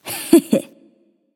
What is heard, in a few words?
hehheh; charybdis